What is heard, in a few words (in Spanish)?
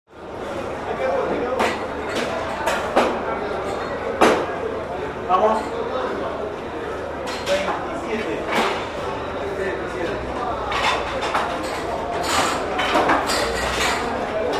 bares pizzeria; santa maria; av san martin y garay; Rosario